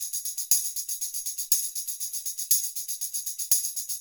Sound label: percussion loops 120; Sampleheads; NYC Percussionworks; 90 s Sample CDs